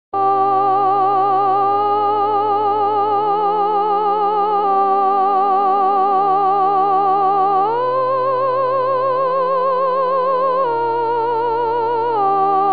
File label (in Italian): Magnificat soprani, voci guida; corali in vaticano 2018